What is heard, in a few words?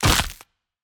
Player Attack Hit Blood; Pulling No Punches